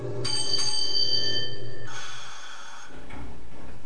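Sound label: Door Close, Train; OpenBVE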